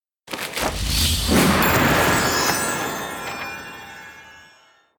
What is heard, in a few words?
scroll Anim; netent; wishmasteroct